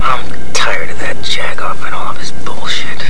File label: tired